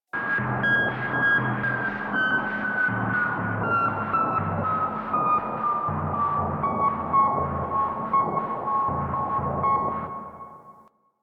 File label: Clan Dojo Misc, Ambience; Warframe; Dojo Randoms